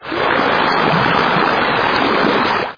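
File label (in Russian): течение шумное близкое бурное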